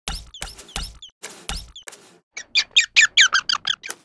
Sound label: Otter Action; Seer; GamigoNA